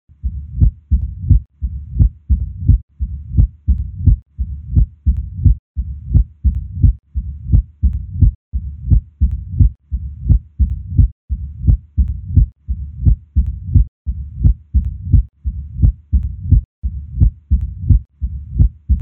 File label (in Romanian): stenoza mitrala apex